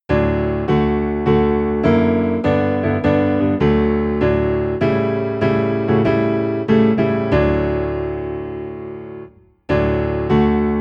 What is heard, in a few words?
Piano Low in D, hymns; Hi G Lo D; Tell It to Jesus